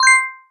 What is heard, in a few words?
tyarin